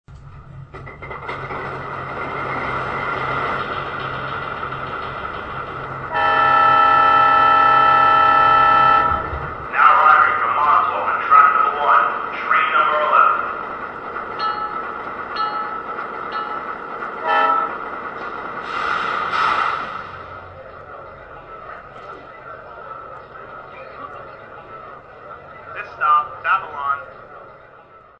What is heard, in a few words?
Alco FA-2, trains